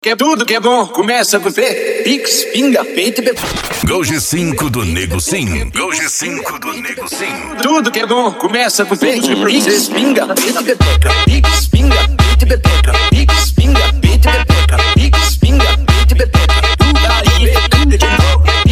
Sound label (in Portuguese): funk mega bass e noia dance